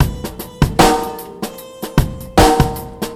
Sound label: 75bpm, Hip-Hop Street Beats; Construction Kit; Street Beats by Poogie Bell